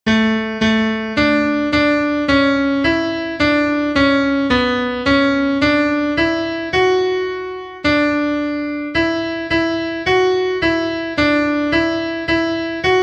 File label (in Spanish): CONTRALTO, himnos en piano; cantos del camino